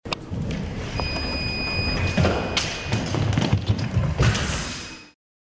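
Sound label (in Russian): короткий звук атмосферы в зале с сигналом и шагами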